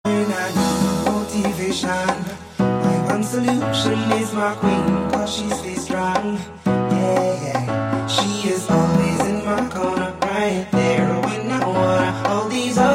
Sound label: iphone 11